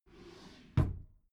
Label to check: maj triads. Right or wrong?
Wrong